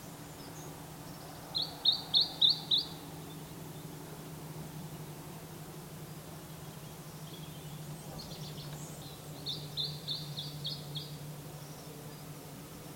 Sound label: Iberian Chiffchaff Phylloscopus ibericus